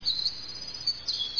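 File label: winter wren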